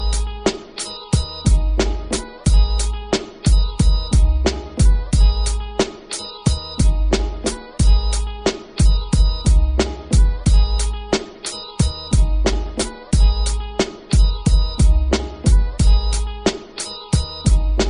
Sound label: base hip hop ringtone